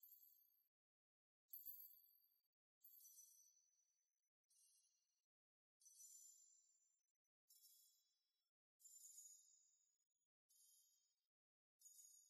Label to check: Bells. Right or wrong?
Right